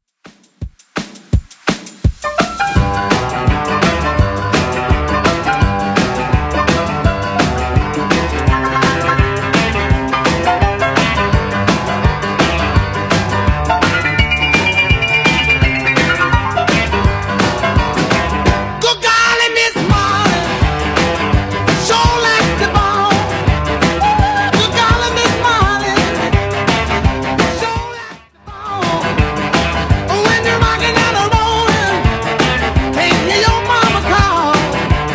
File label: 50s Redrum 168 bpm